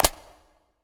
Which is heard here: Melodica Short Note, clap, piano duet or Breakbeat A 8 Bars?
clap